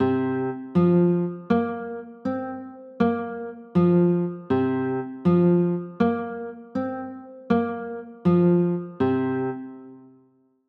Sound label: c 7sus4, chords